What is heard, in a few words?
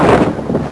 para deploy; firearms